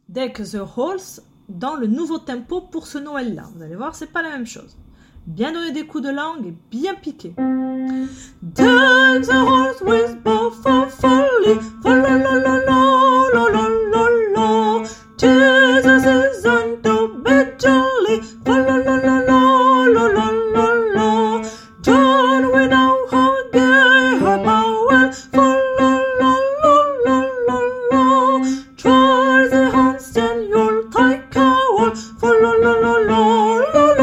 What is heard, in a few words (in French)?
ténor